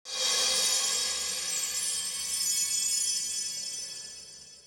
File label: scraper; Rumbles